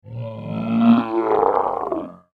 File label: Orc Champion, monster; fantasy sound effect